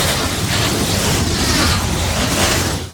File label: Tornado target; Dota 2